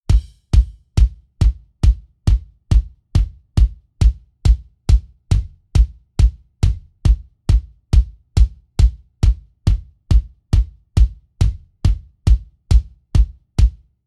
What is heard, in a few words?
layered sound kick layered